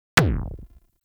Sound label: Arch Gun Primary Beam Punch 3D; Weapons; Warframe; Archwing; Hildryn